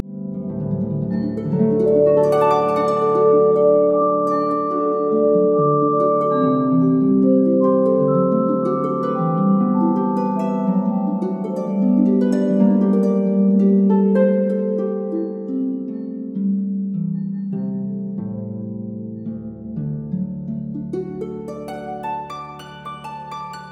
Camp Harp E

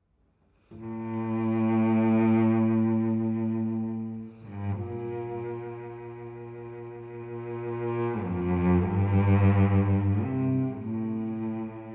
Savannah Music

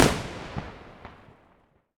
Explode; Blastmorph Pinball; xbox series xs

